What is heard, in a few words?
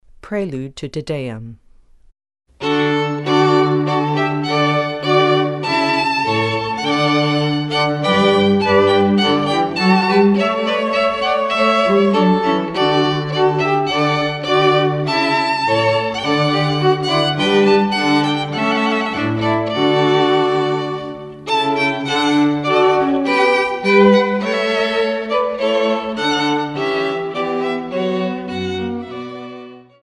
prelude to tedeum, string quartet